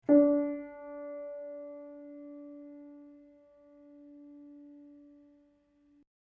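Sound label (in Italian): Re3 new, Replica di martelli Pleyel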